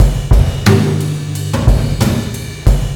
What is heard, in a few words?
JZ 90 DRUM, ACID JAZZ; Best Service; Advanced Media Trax Modern Composer